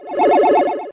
ufo

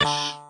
purchase failed; guodongrenwu

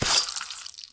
whack

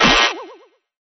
wrong

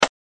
bomb

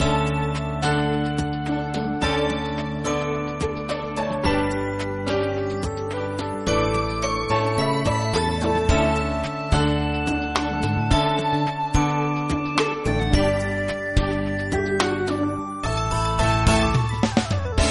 bg Sound; game; huaban

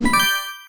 match

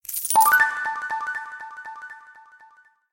purchase complete; game; guodongrenwu

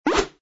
page

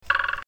sound jump

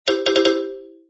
win

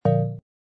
error

